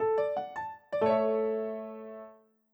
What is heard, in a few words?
Piano; SampleTank 5; これは最後、落ち着く瞬間にだけ現れる音ですね。; Berlin Grand Piano